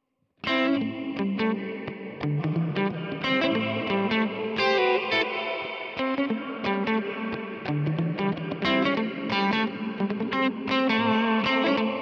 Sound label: Strymon Big Sky Plug in Articulate Chorale Hardware